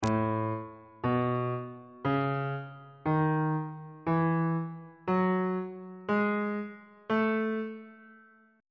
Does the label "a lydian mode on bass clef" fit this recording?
Yes